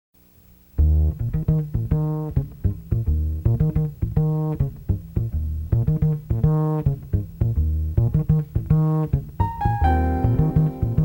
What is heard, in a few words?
jazz trio